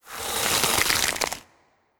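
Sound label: Ice Death swoosh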